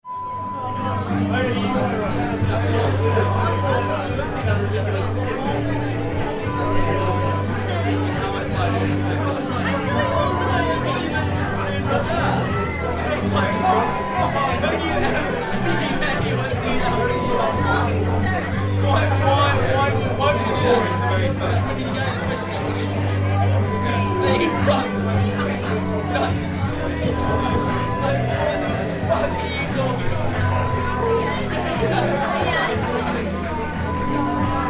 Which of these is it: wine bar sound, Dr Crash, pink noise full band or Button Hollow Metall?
wine bar sound